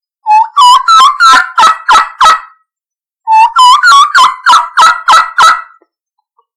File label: Turkey Kee Kee Run; ORION